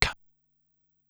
"Kaahh", drum kit; Korg Wavestation-SR; Wave 455 - Drum Kit

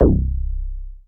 SNGL.BASS, Jungle Rave; Best Service Dance Mega Jungle Rave

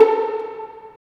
STR VLN PIZ, String Vlns Pizz; Roland - String Master Series; Vls Pizz

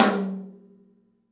OPEN TOM; 3D Sound; 3D Drums

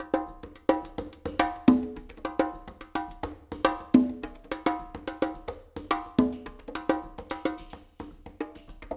conga bongo, CONGAS BONGO; Spectrasonics; Liquid Grooves 1 & 2

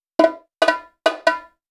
Hand Drums; Sonic Foundry; Cinematic Funk Urban Tone Poems; Master Zorn

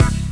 BASS DROP, Synth FX Misc

